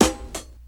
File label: short reverb snare one shot g sharp key